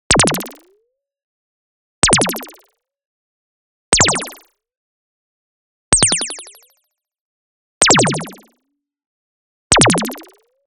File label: LASER Sweep Bubble Up Small; Anime Essentials